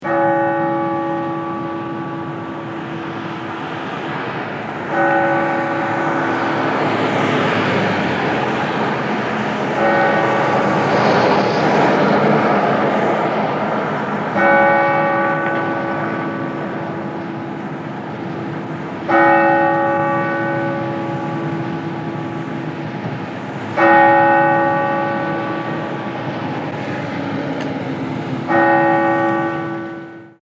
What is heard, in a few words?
Church Bell Tolling Traffic; Musical